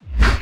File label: BD Gate FX, Bassdrums; Vengeance Samples